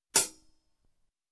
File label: Hi Hat